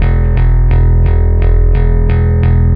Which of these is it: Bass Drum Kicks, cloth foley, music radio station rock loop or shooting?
Bass Drum Kicks